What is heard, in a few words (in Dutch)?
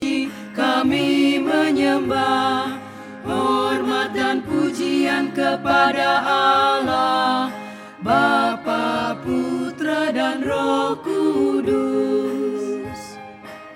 Indonesisch Koor Gloria